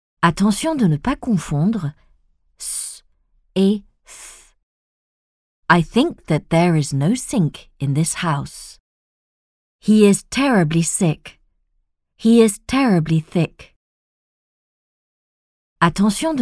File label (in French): son techno